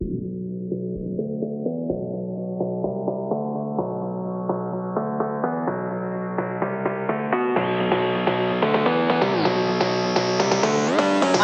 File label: phonk